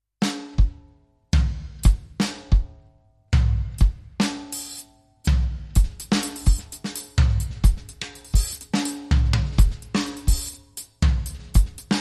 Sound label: Solo Bass Groove